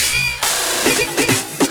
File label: Fill 140 BPM